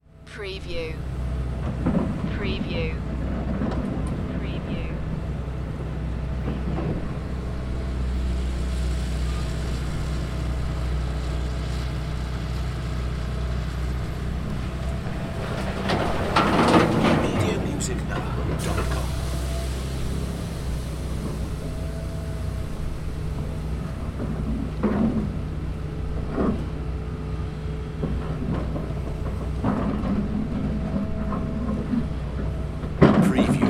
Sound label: Construction - Excavator Working